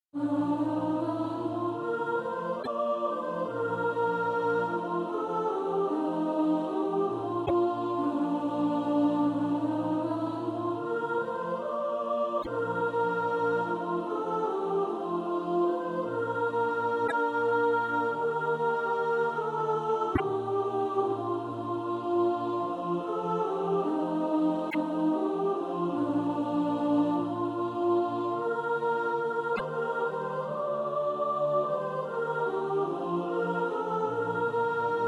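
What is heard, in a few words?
soprano, SATB Practice Music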